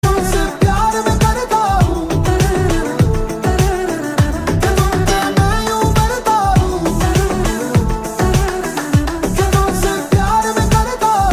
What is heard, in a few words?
ringtone, bollywood ringtones